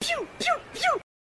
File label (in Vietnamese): Tieng sung chiu chiu chiu meme